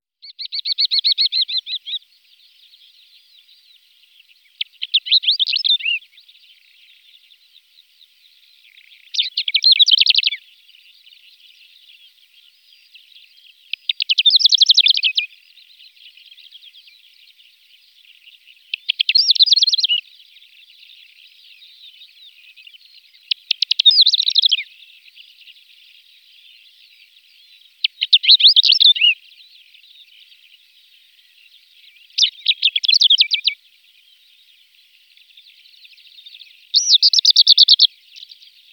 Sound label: short toed lark